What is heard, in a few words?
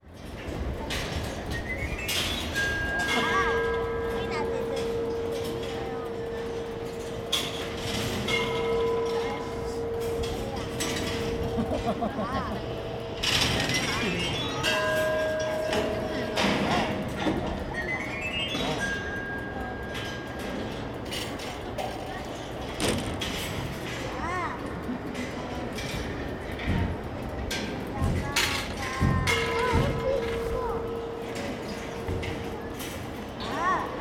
Toy Tower